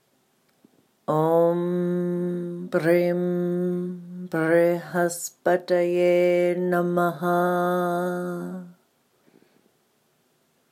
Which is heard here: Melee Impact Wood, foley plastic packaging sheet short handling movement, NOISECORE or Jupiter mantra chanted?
Jupiter mantra chanted